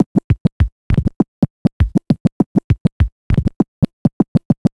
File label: Vinyl Scratch Kick